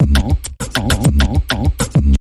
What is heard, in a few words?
Rhythmic Percussion Variation Short Version